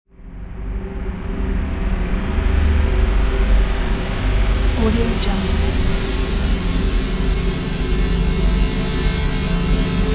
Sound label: space tension